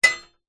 impacts metal hollow frame hit sharp strike rattle